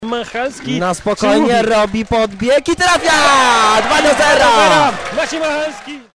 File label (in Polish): 3 gol; Znicz Rybnik